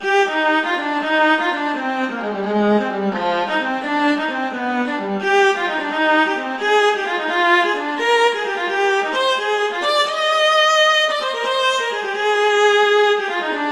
Gigue; Bach; Suite 5

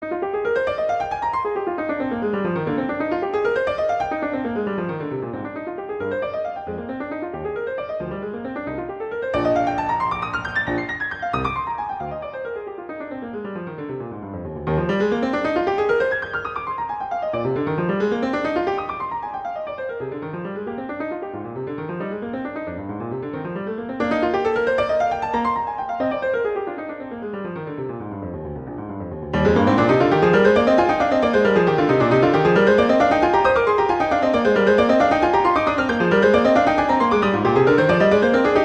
Exercise - Eb major; Clementi; Preludes

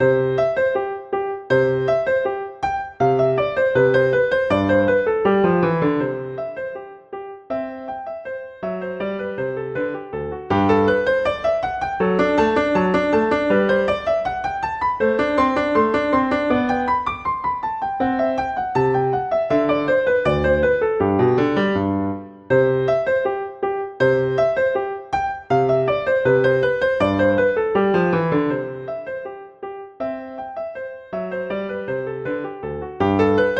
Sonatina, Allegro; Clementi; Sonatinas

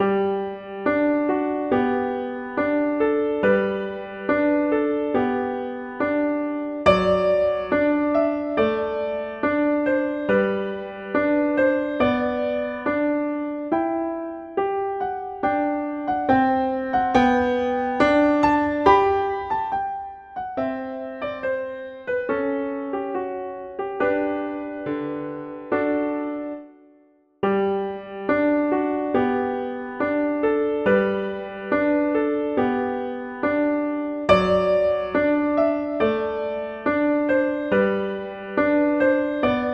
Sonatina, Un poco adagio; Clementi; Sonatinas

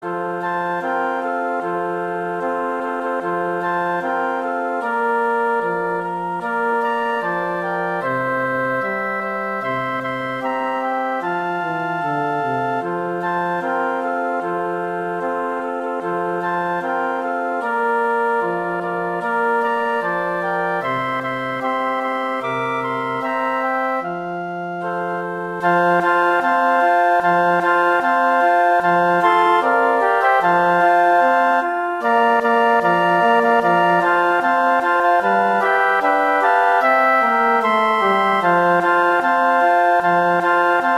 Jingle Bells, Christmas Flute

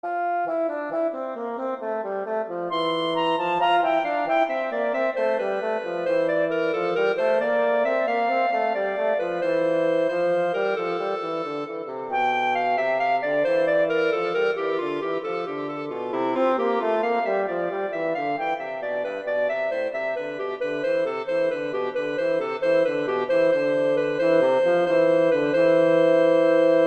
Gigue; Pachelbel; Canon

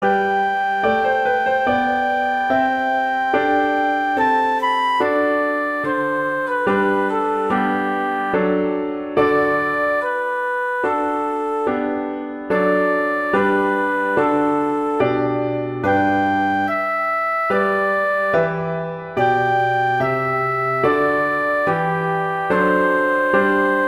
Adagio; Handel; Op1 No5

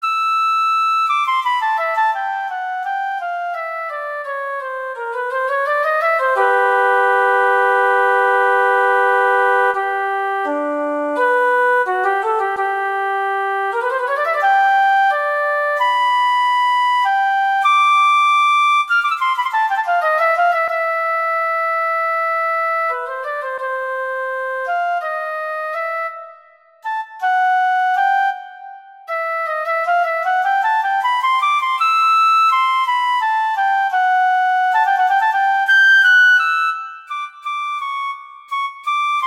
Andante ma non troppo - Extra Cadenza; Mozart; Concerto K314

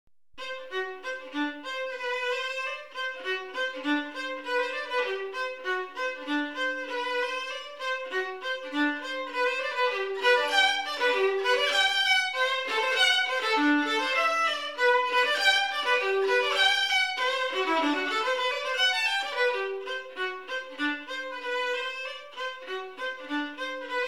Melody, Violin; Fitzpatrick; Violin Melodies

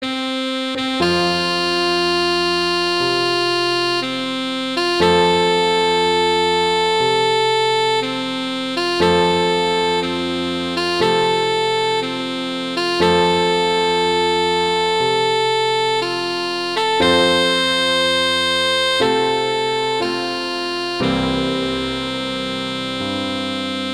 Taps, A Sax Pf; Patriotic

